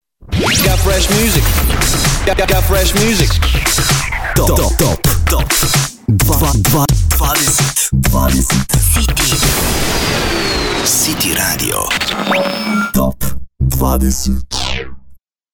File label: top 20 jingle; city top 20; rtm